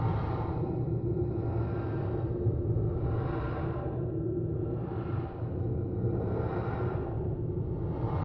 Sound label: ambient; 30 cc0 sfx loops